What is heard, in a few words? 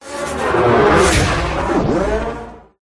whoosh; Audio Mangler